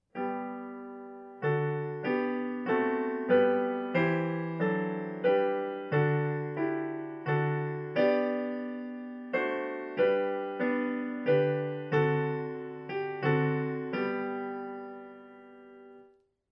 Hyfrodol original tune book version

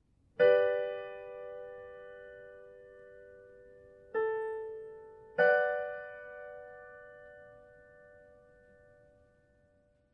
Mediant mod G to b minor

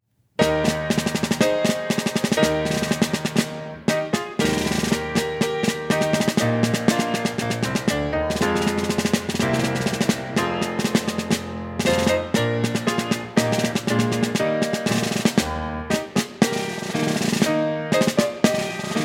Snare Drum

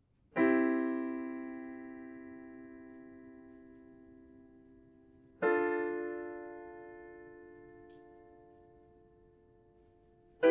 Triads

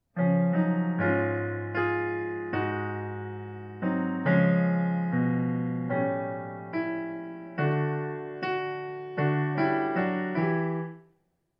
Modulate up by Half Step

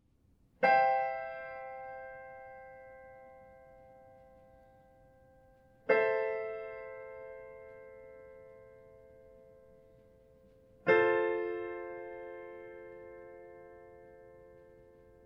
Voice lead by step in root position

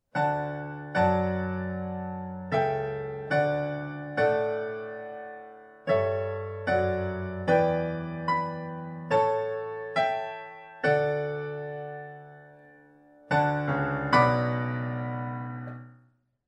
Organ octaves

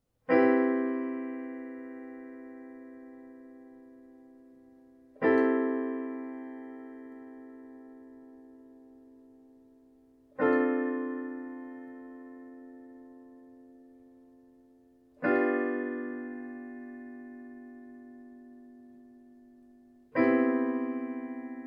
Voice leading using seventh chords

